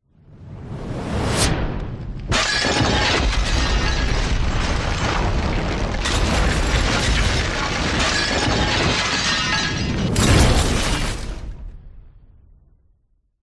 explosion shockwave appraoch causes building collapse